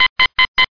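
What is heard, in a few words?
fuel warning; Software Explosion; Fore-Matt Home Computing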